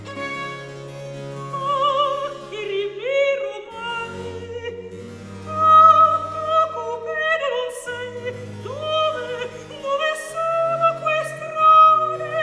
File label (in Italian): Recitativo; Ma, che rimiro omai; Alessandro Scarlatti; Duet Cantatas